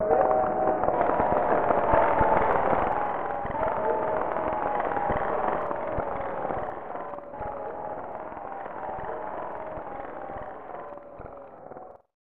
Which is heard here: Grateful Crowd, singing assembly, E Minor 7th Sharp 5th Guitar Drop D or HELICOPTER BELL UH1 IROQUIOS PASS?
Grateful Crowd